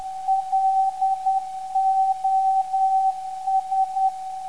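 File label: 429kHz, non-directional beacon; LOS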